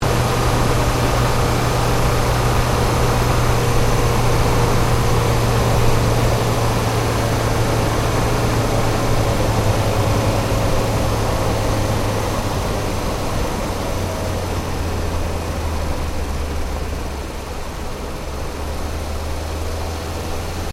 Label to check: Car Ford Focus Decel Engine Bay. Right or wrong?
Right